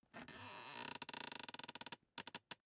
office chair creak lean back; objekte; stuhl

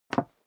step leather shoes wood parquet single stair down; schritte

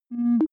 click tonal distorted, signale; kommunikation

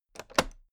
toolbox latch open regular

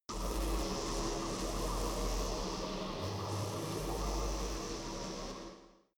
space buzzing energy alien shuttle big busy; energie

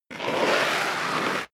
step leather shoes concrete grit slide long; schritte

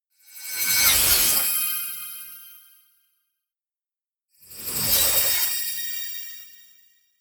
beam

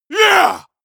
male combat attack short

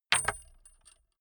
metal rattle wood drop

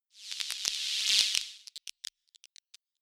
notification electric swell crackle, electronic; comedy-cartoon

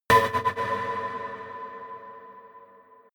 energized fuse; whooshes-rises; hits-impacts

